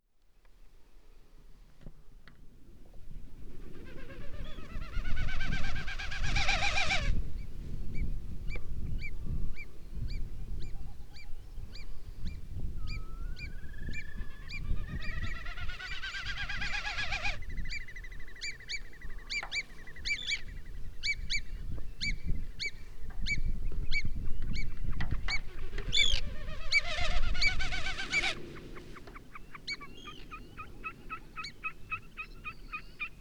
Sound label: Gallinago delicata - Wilson's Snipe